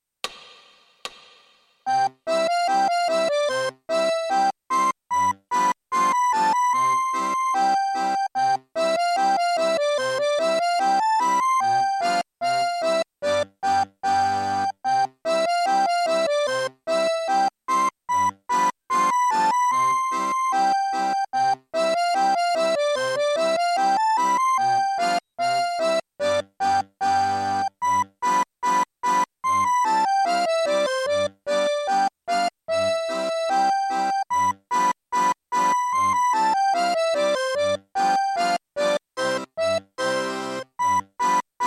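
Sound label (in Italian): Scherzetto, Fisarmonica quasi tango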